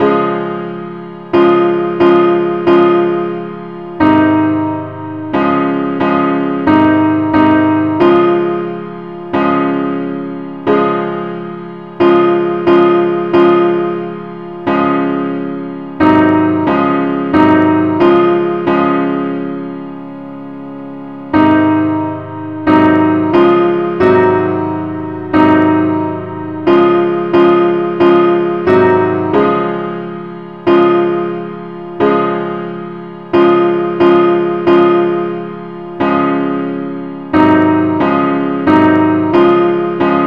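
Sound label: simple accompaniment key D flat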